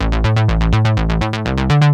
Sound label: 80s bass long